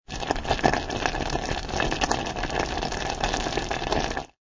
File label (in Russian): hlebanie vsasivanie dolgoe